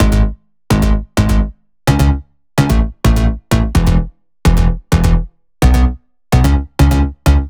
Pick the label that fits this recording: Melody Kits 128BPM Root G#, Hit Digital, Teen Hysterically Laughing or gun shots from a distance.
Melody Kits 128BPM Root G#